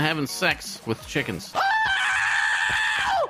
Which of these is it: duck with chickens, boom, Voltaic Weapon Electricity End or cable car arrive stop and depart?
duck with chickens